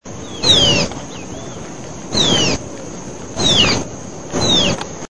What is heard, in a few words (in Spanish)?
voz boyerito; icterus cayanensis